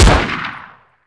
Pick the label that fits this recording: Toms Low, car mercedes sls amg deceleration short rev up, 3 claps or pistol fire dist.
pistol fire dist